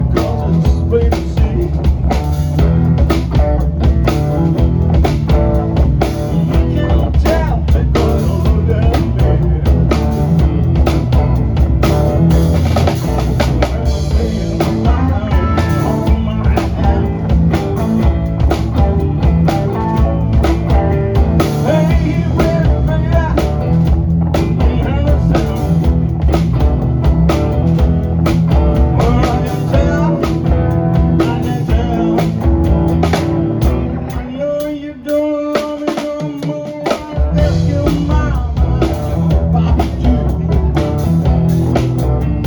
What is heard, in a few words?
drums